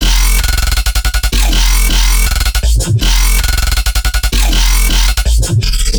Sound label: Bass Loop 160 F; Trap and Dubstep; Ghosthack; Free Trap and Dubstep Samples 2018